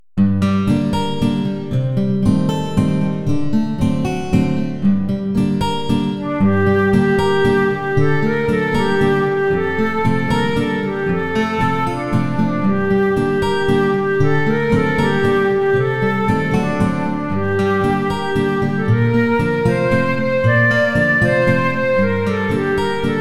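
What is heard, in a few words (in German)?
Ich komme schon durch manche Land, Gitarre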